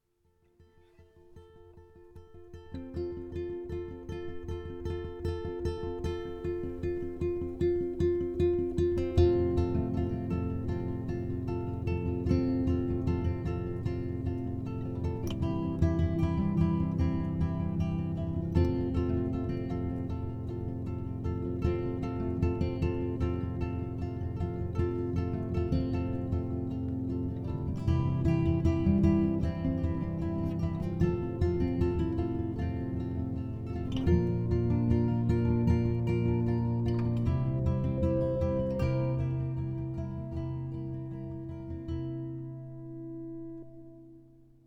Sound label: music; ChloeRoomPuzzle FrankAlive